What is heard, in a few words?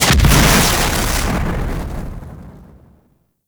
Fire Ball Explosion; Warframe; Sargas Ruk